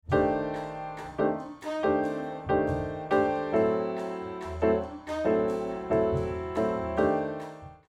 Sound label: Piano Mix Proc HPF EQ Para Comp